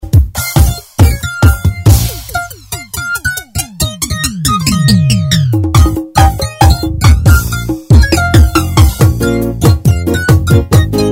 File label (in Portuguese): ritmos